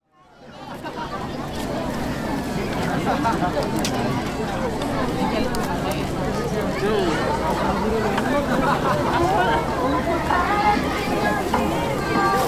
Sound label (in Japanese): uchiage hanabi kankyaku; Chino Cultural Complex